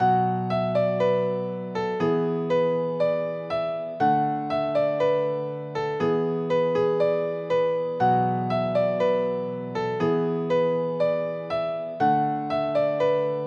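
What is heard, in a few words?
cheerful piano synth; by burak sound bank; cheerful vol 9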